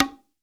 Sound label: BONGO, STEREO BONGO, Cuba; AKAI S6000 CD-ROM - Volume 5